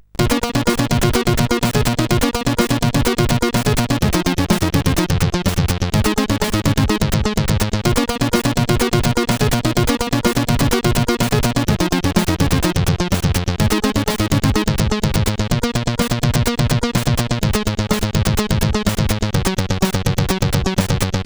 sid CSG8580R5; Flipin Notes

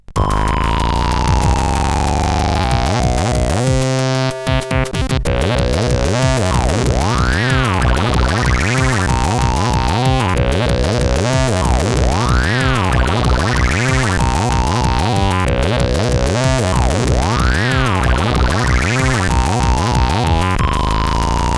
Tekkno, CSG8580R5

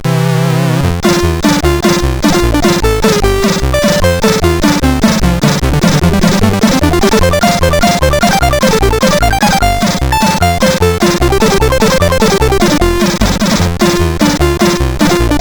sid CSG8580R5

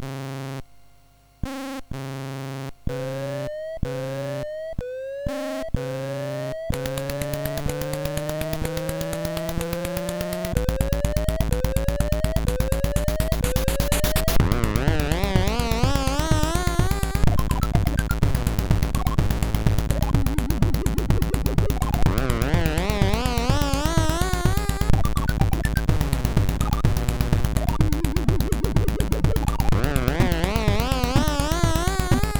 tune, MOS6581R3; DEMOS; Batt-Man tune 2

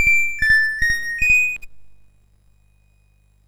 MOS6581R2, sid; Defenders of the Earth

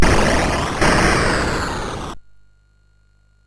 sid MOS6581R4; Tiger Mission

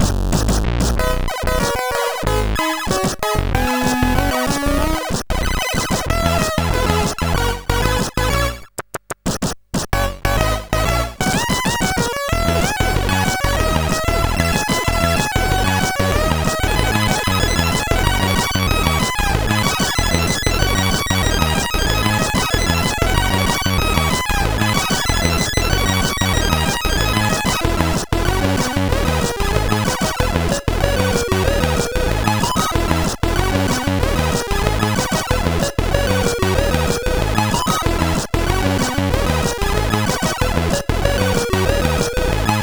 sid CSG8580R5

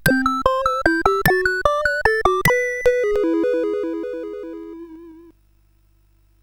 sid CSG8580R5

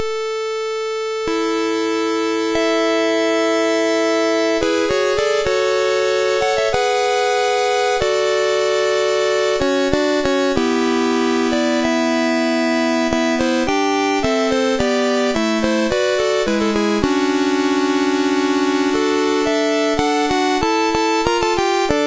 Music Box, sid MOS6581R2; Randall; Eight C64 Games in Six Minutes

